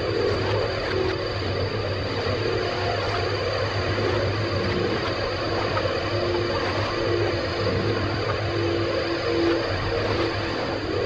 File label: revive pet loop; LoL; yorick